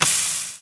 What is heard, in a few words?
energy meat, Guns, Hits; Weapons